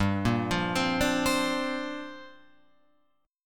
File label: G Minor 11th, chord, Guitar Open F; Gm11 arpeggiated; JGuitar